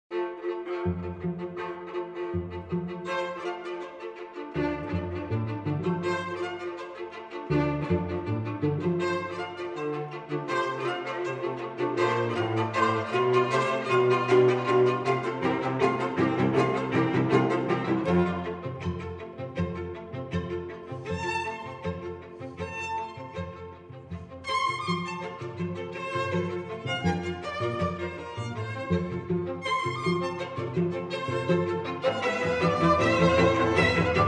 Dvorak String Quartet

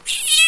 Cat Meow Nervous

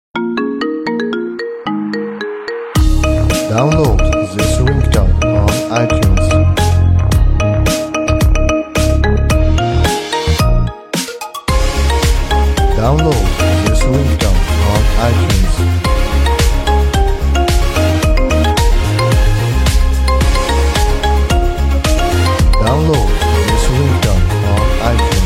Marimba

